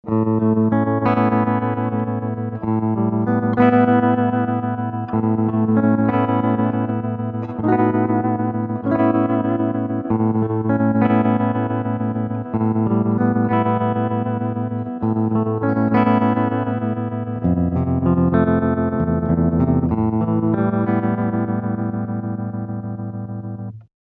65 Photo Tremolo

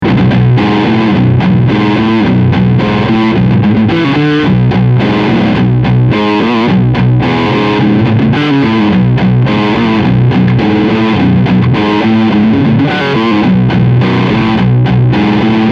Riff Front HB

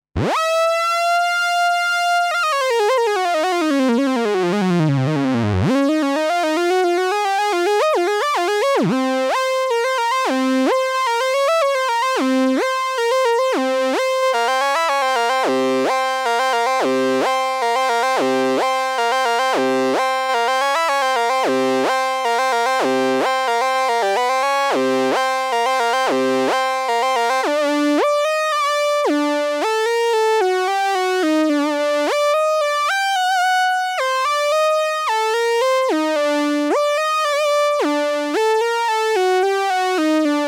synth solo

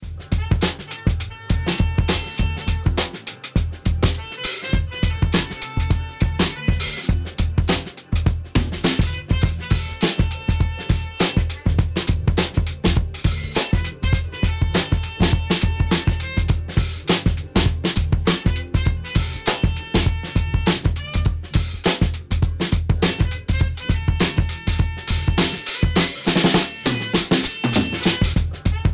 Ableton Drum Buss; Bonzo on the Dials

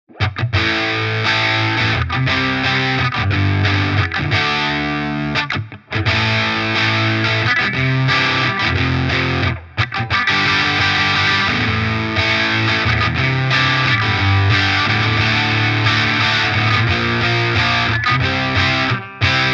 Berkeley Bite Bright Switch on; JHS Pedals

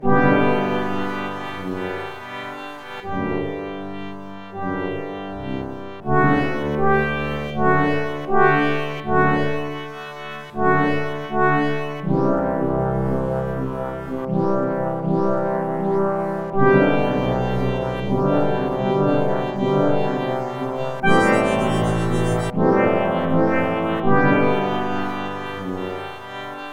crystal Baschet

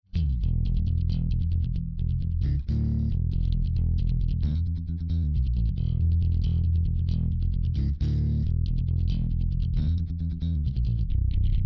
VT500 SVT Style; Bogart

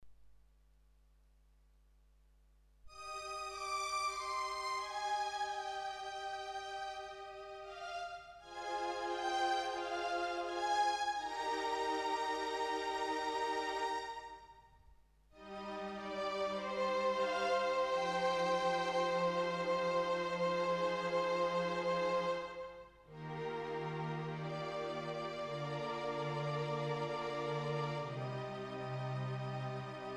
Strings; Coda Pro

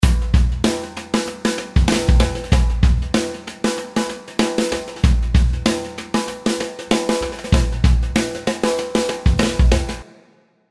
Drums Acoustic Snare Tail

